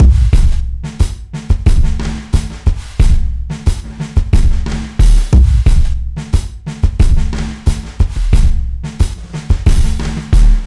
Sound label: 090bpm, Downbeat Dance Grooves